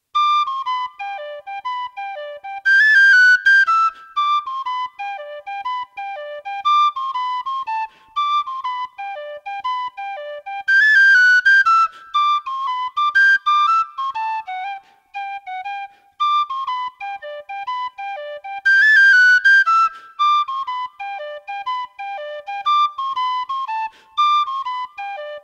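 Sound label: Greencastle Hornpipe, Traditional Irish Music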